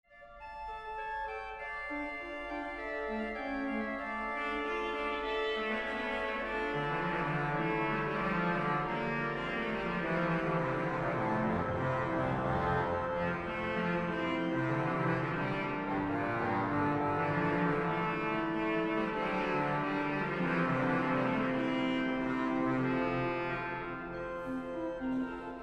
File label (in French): IMPRO basse de trompette